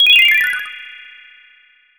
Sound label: Lose Coins!; super mario rpg 1996 snes